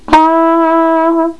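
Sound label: FLUGELHORN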